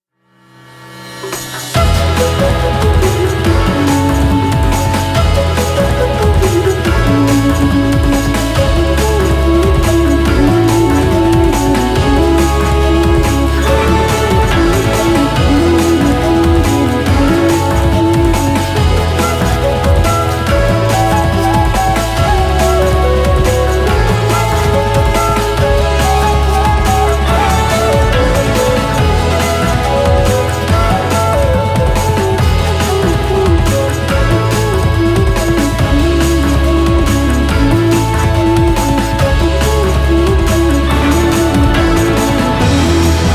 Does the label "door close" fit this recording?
No